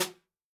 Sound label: jungle snare chromatic, real drums